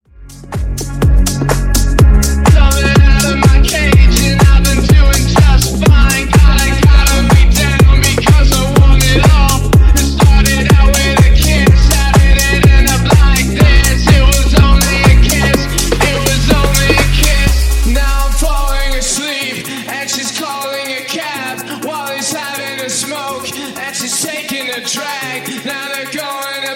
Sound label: Funk Bootleg 124Bpm